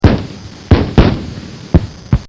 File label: Rifle Recoils; Rifle Sound Effects; Guns